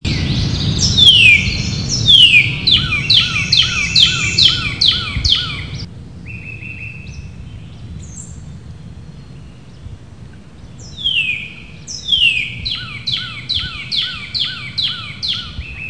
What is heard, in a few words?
Cardinal